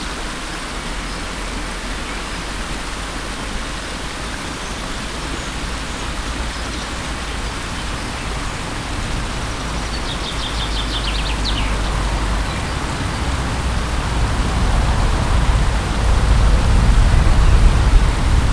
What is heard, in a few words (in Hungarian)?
madár balra kettő autó, patak; visegrad